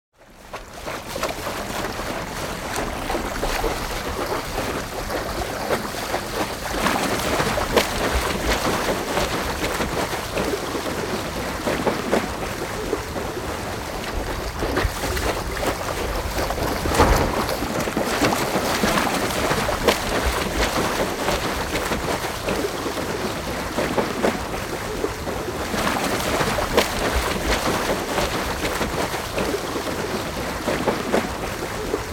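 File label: Catamaran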